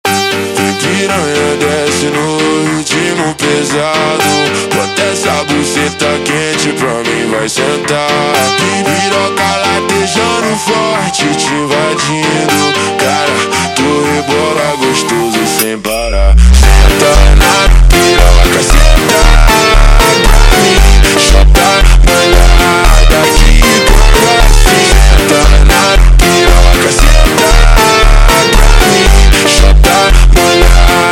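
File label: slowed, phonk music; funk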